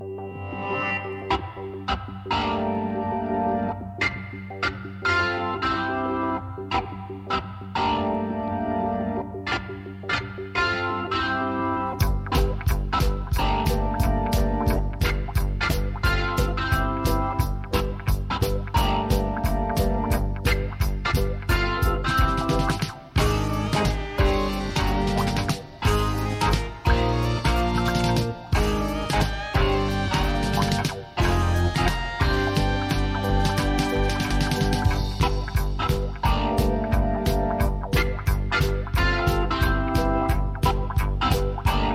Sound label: hats of glass